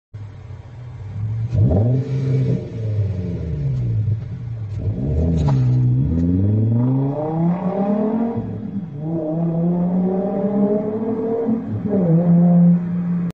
Kia Sonet; ماشین

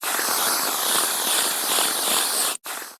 cream; خامه فرم گرفته

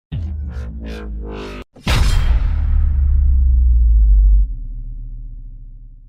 Dropping

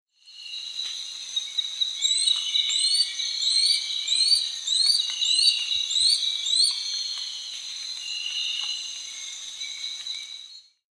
Lanceolated monklet

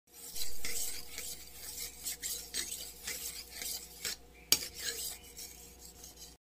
Sharpening blade

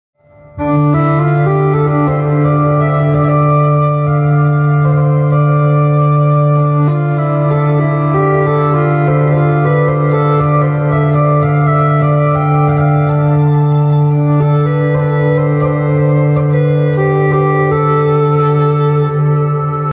hurdy gurdy

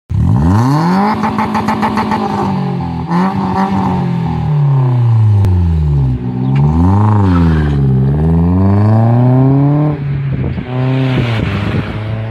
L90 exhaust

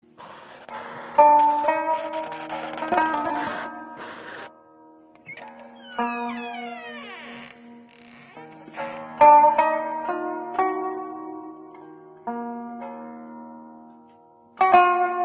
selane

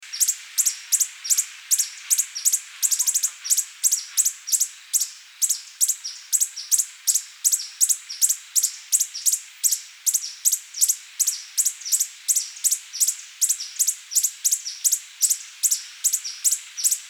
Coppery headed emerald

